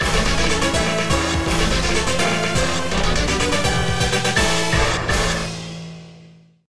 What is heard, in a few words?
Level Clear; Paper Mario - The Thousand-Year Door (GC DSP); Bowser Level